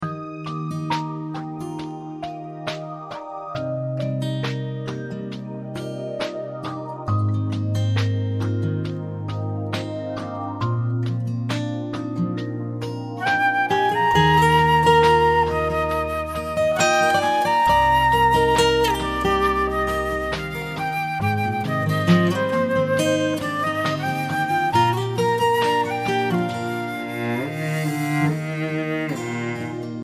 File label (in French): musique